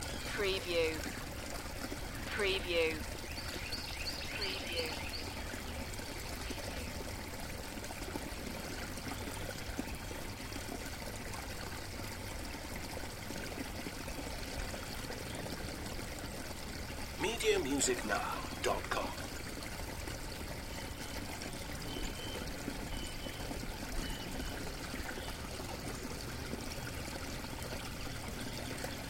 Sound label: Quaint Brook With Birds Loop